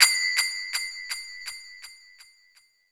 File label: Echo Finger Cymbal; Sonic Foundry (Sony Creative Software) - Futurist Drum & Bass; Drums & Percussion